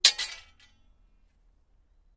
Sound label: Sword Clangs Sword, swords